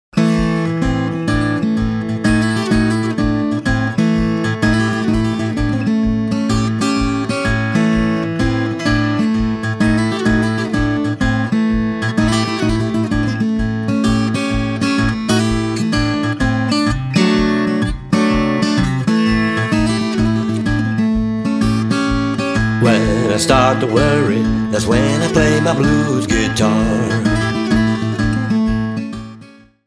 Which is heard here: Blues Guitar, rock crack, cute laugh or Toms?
Blues Guitar